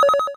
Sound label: coins; sky knight